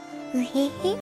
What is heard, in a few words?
mwehehe